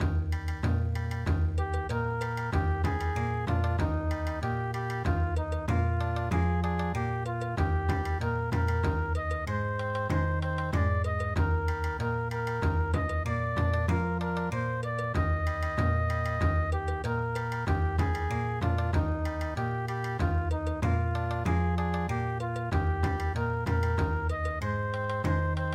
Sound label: Bon Odori, midi